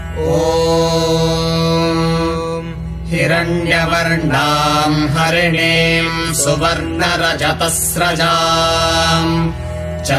Sri Suktam, Lakshmi Suktam Vedic Chanting Lakshmi Mantra